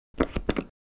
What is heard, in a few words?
Soap dispenser bathroom pushing or pressing